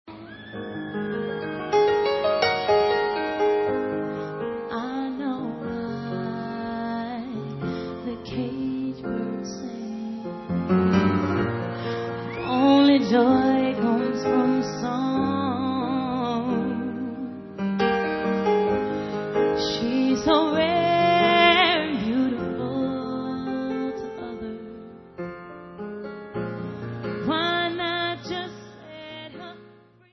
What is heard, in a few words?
unplugged version